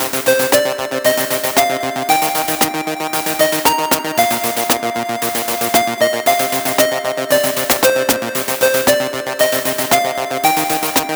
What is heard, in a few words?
Loop - Platforms; The Adventures of Elena Temple